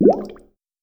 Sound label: liquid bubble